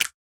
Clap Hit, Dancefloor Pop; Remixlive

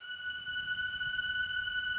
String Hit, Dancefloor Pop; Remixlive

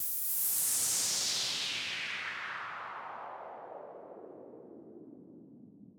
FX Glittering Hit, Dancefloor Pop; Remixlive

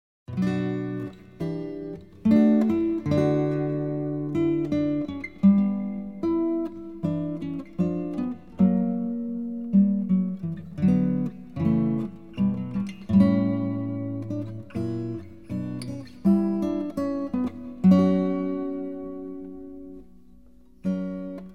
Sarabande From Violin Sonata In B Minor

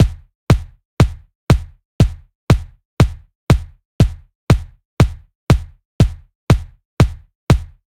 Kick; Dancefloor Pop; Remixlive; FreePacks

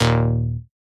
Bass Hit, Dancefloor Pop; Remixlive

